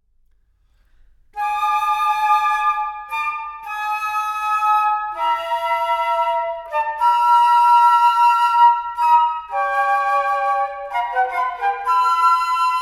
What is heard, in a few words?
fanfare